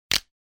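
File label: vertebrae broken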